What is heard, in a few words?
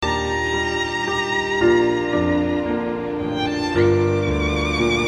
Game Tune